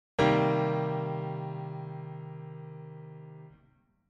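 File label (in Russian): малый минорный септаккорд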